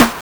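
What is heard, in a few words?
Snare 8bit, Drumsynth Drums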